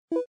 balloon

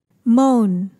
moan

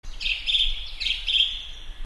birds chirping

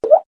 waterdrop

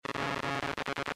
electromagnet

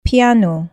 piano

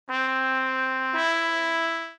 trumpet

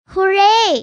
hooray

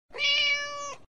cat Meow short slow

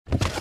cardboard box open; zapsplat

